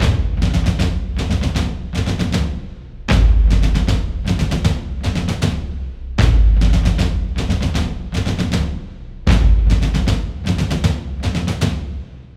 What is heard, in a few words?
Drums; For Honor